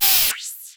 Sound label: Spinner